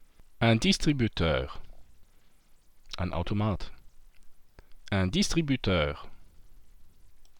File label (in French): distributeur